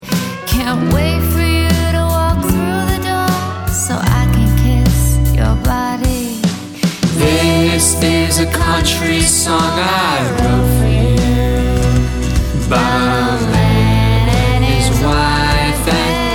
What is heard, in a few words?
A Country Song Part Female and chorus